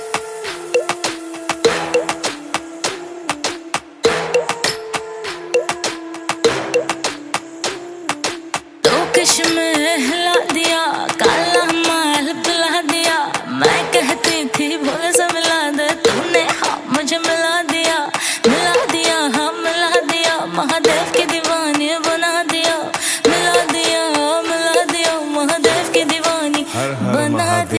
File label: music ringtone bollywood ringtones